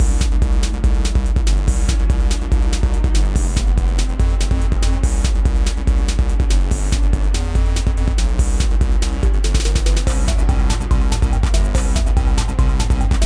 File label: Space (Fast); Pocoyo Racing; wii